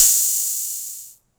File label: tr606 hat